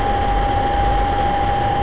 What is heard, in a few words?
ventil, TGV; TGV Sud -est; OpenBVE; Train; Carmillon